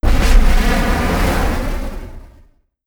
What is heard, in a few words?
Rocket Start, Explosives; Trails